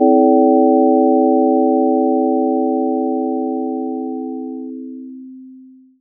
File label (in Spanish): inarmonico Sin Altura; Sonidos de espectro no armónico. No son periódicos.